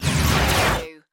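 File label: Production Element Sweeper; Sound Effects Bible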